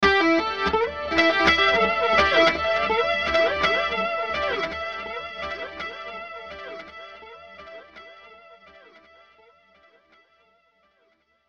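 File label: Musical Element, Drum Snare March; Blastwave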